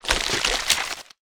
big gore; factorio 2.0.55

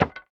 wood inventory move; factorio 2.0.55

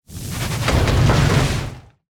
spidertron debris; factorio 2.0.55

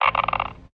nuclear inventory move; factorio 2.0.55

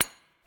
manual repair simple; factorio 2.0.55

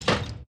ammo large inventory move; factorio 2.0.55

